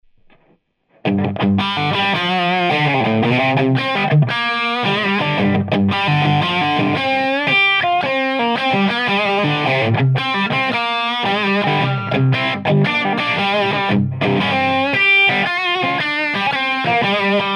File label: lead